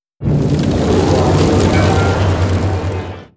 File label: Skill Firebird; FlyForInsanity